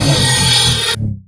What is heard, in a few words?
player exit speed paint